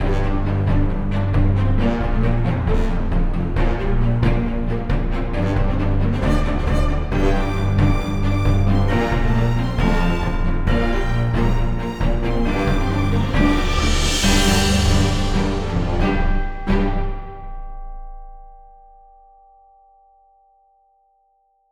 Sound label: Quiet Symph